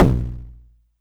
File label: BD